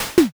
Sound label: garbage